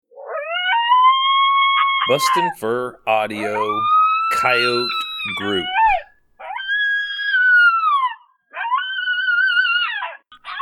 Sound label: Coyote Group